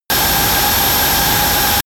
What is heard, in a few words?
bomb fly fall